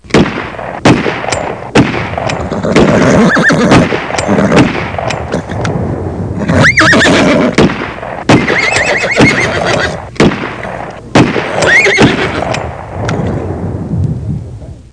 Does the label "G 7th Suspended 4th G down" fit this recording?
No